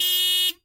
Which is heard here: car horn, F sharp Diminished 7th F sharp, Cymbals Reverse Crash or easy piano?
car horn